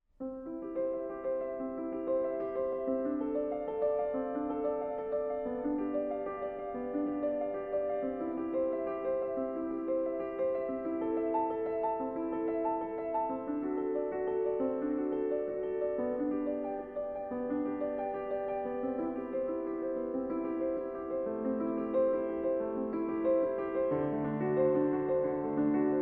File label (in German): Preludium C dur